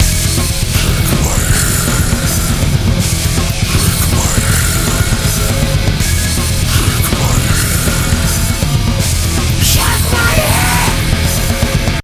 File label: Metal Music; There Is No Game: Wrong Dimension